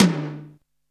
R8 Tom, Roland R-8

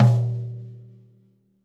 floor tom - snares on; JazzFunkKit

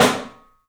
PIC SNR, PICOLO ROOM; 3D Sound

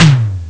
electom; Amiga Soundtracker Sample Packs

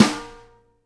snare NYLON, Live Snares; E-MU Producer Series Vol. 8 – Platinum Phatt (CD 1)

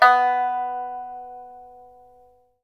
PIPA B4, CHINESE SET; Spectrasonics; Heart of Asia

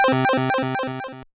Arp, Stab & Hit; Bitkits

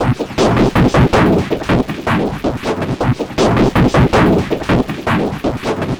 Noise Beat; Drums & Drones

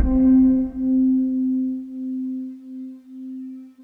HARMONIC C3

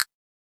Hip-Hop Kit Ping-Pong; Roland Juno-D

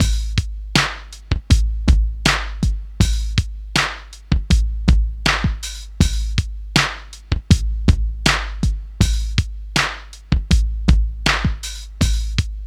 HIPHOP, Funky Xtreams; INIS

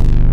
S FUZZ BS, DANCE; Pro-Rec - Synthsonic; Z1 DANCE

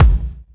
Kick, Kickdrum; Equipped Music; Loungin House

